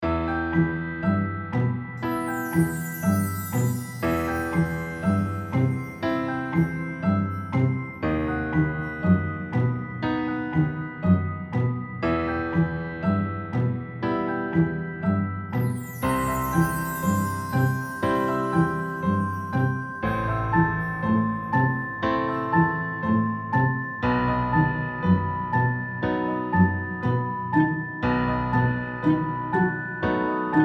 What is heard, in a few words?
Suspense; Celeste